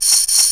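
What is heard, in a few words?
tambourine, drums; CMP - Analogon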